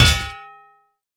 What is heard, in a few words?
Lamp Post Fall